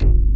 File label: JAZZ ORG, BASSES; Pro-Rec - Synthsonic